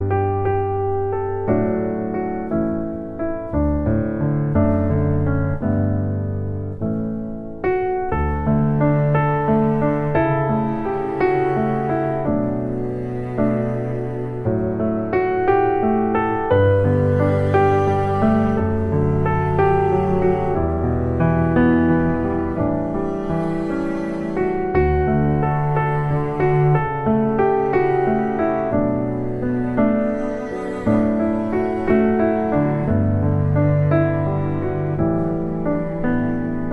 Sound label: instrumental